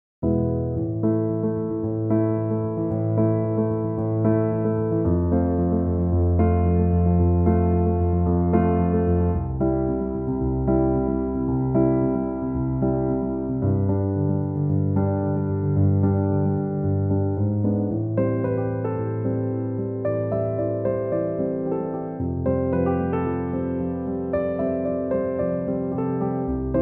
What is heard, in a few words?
piano instrumental